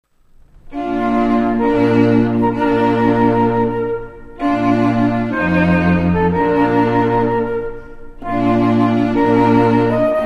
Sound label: bridal chorus, trio